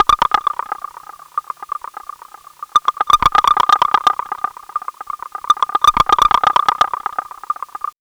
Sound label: plop, fx & sweeps; EZRollers